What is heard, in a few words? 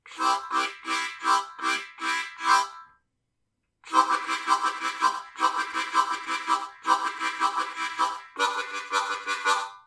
HARP CHUG